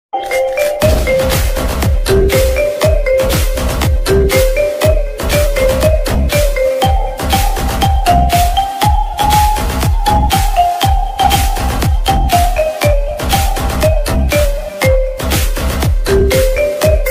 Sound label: ringtone instrumental ringtone; money heist